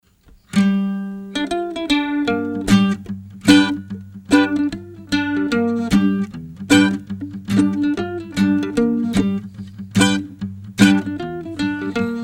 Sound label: Mandolin Jam